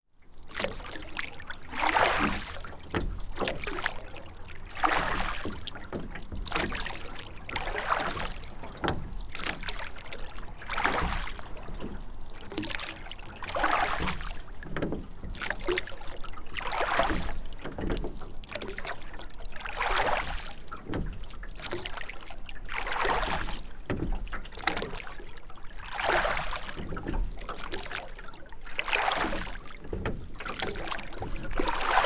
rowing boat rowboat; transportation

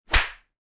whip whoosh

